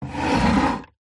household chair wooden old slide scrape wood floor recorded under seat